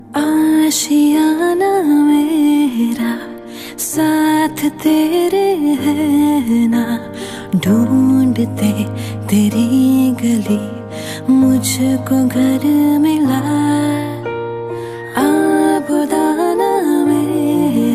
Female Version Ringtone

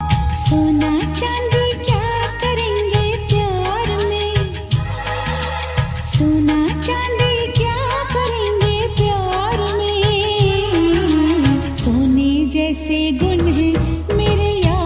Old Song Ringtone

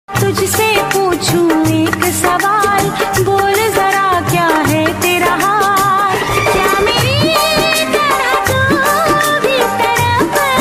Old Hindi Song Ringtone

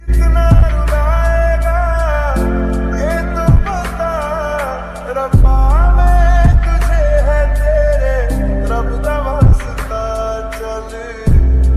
Sad Ringtone

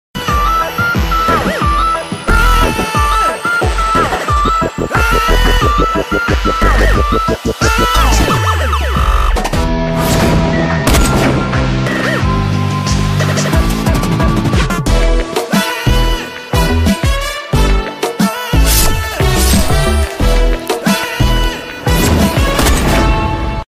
Mass Bgm Ringtone; Abrahaminte Santhathikal; Mammooty

